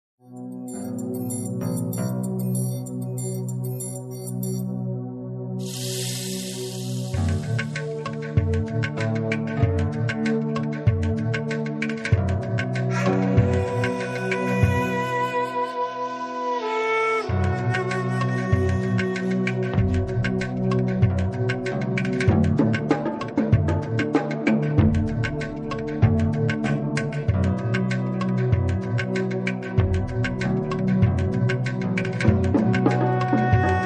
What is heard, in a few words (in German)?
klangpyramide